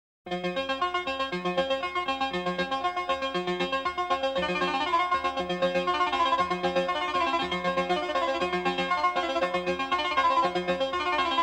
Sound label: music